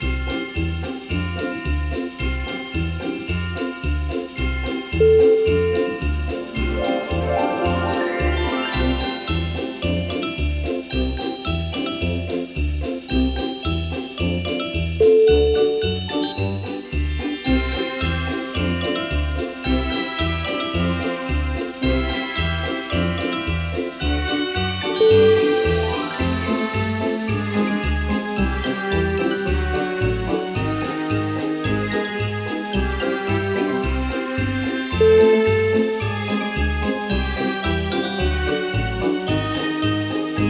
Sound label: magical christmas tune